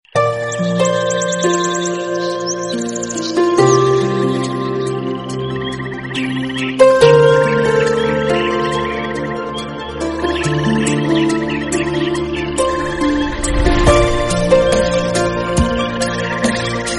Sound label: SPRING ALARM TONE